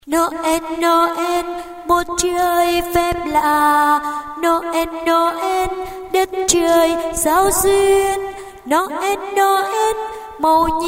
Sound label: alto